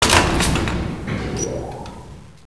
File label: buzzer lift start